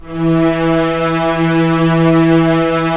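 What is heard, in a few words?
STR SC, strings; Roland - LA Composer vol. 4; Full Sec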